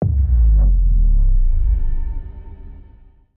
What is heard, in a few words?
Baller Drop Hit Downer; Ninja Tracks